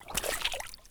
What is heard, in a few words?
swamp walk; Escape from Tarkov